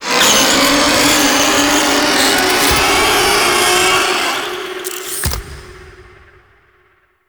Death Vocal; Cinematics; Archon Death; Archon Boreal

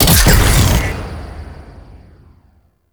Layer Alt Shot, Shotgun; Warframe; Weapons; Tenno; Revenant; Phantasma Prime

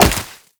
Wall Jump Snow; Footsteps; WallRun; Warframe; Characters; Tenno

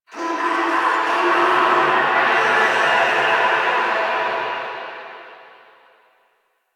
Distant Sewer Grate Screams; Infested; Warframe; Ambience; Nineteen Ninety Nine

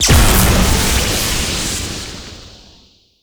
Void Dragon Orb Proj Explosion Lightning; Enemies; Abilities; Warframe; Duviri

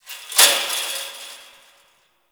Fence Rattle One Off; Warframe; Ambience; Nineteen Ninety Nine

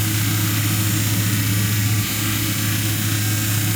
Mods Motor Large Loop; Warframe; Hub; Liset Machines; Tenno Mods

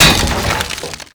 Wood Bench Destroy; Warframe; Ambience; NineteenNinetyNine

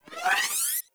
Biped Railgun Charge; Warframe; Corpus; CorpusRailjack

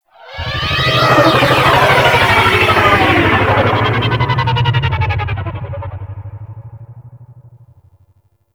Fly By Slow F; Vehicles; Flight; Warframe; WM Liset

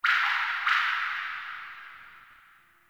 Sentient Lair Drip Interior Small; Warframe

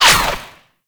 Ranged Weapon Whizzby

